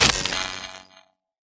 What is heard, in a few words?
Radar Jammer Jammed Start; Boost Card; Titanfall 2